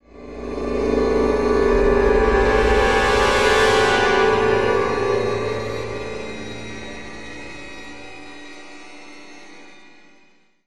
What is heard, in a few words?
STRING HORNS